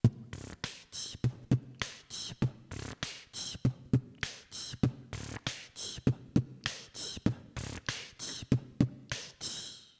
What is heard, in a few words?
8beat